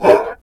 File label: Infested Melee Grunt; Warframe; Characters